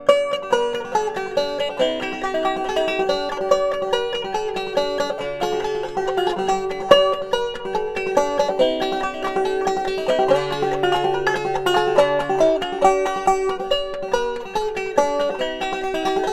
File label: Power and presence abound in this rim. Plenty of cutting power. Overall bright as you expect from African blackwood., banjo; Coal Creek March